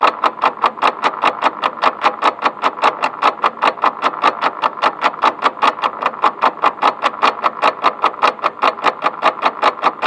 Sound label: Ball Hall Timer Loop